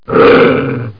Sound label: SNARL